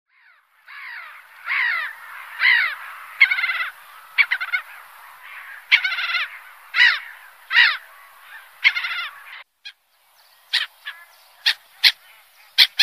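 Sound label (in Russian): озерная речная обыкновенная, чайка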